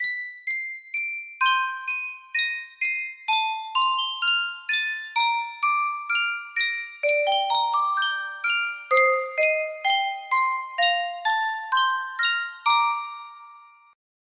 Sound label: music box